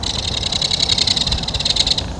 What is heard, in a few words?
kingfisher; natureNavigator